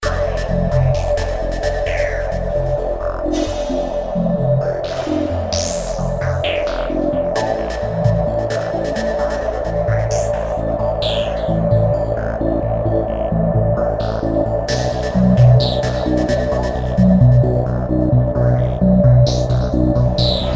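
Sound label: Abandoned Facilities Instrumental Ambience; The Dwarf Run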